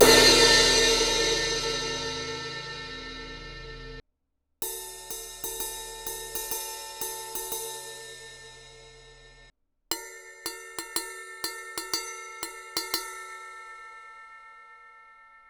18 inch brilliant rock crash crash ride bell, cymbal; alpha; Paiste